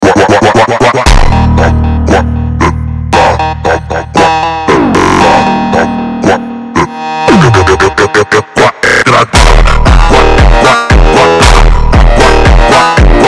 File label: Funk